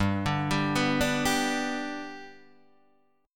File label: G Major G down, chord; G arpeggiated; JGuitar